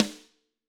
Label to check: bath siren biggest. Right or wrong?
Wrong